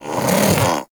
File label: Armadillo Vocal Alerted; Warframe; Characters; Hunting Critters